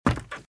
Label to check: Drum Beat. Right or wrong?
Wrong